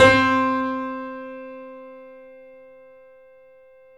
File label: 3D Pianos Boesendorfer; E-MU Producer Series Vol. 5; 3-D Audio Collection